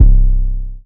swish 808; drumkits; fl studio

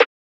Snare

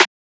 snare; FL Studio; xander brown drumkit

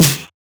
snare; goodtakimu drumkit; fl studio; drumkits

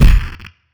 kick; goodtakimu drumkit; fl studio; drumkits

